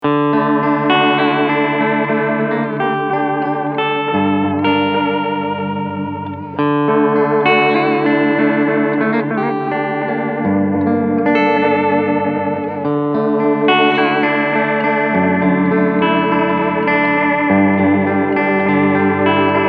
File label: dotted 8 medium delay